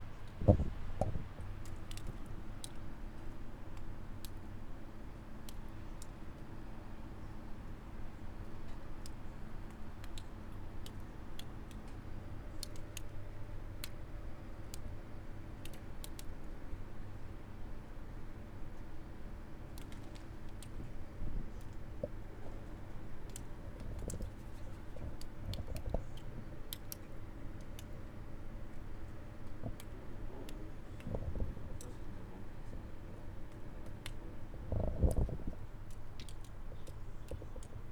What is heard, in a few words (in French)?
8h du matin, appartement